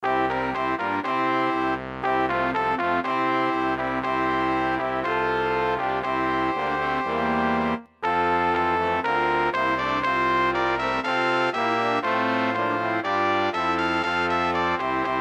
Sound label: Joy Fanfare